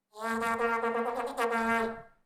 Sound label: Vuvuzela; TheWorkRoom Audio Post